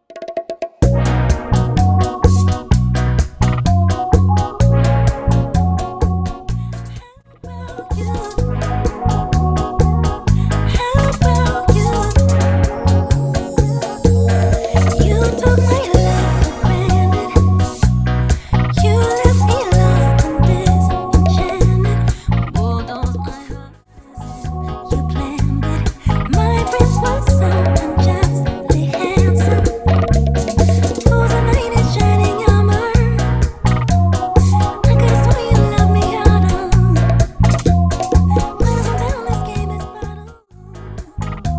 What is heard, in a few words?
Bachata Project 127 BPM